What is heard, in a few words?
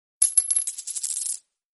coin drop